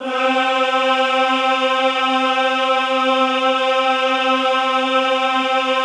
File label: EHH C3, Classical Choir; Best Service; Extended Classical Choir; EHH MIX